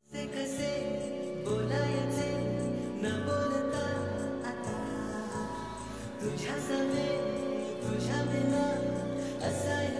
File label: marathi song ringtone